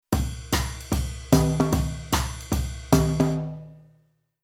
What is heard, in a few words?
Rhythm Swing Jazz